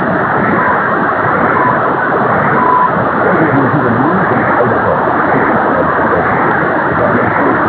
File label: 837 nz, AM; Grayland Beach State Park, WA; Perseus & various antennas